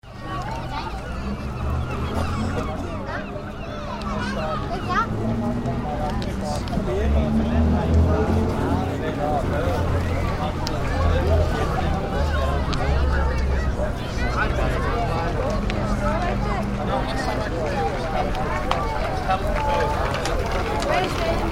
Typical English seaside street with passing holidaymakers.; Coastal Street; Crowds (Sound Effects:Backgrounds)